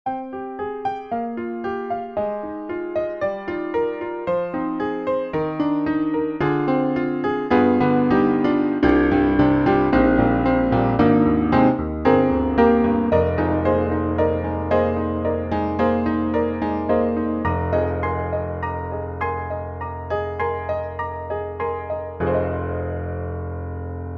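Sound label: Broken Piano Vintage Upright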